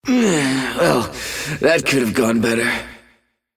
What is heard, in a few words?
die; Bad Brawler; Mob Voice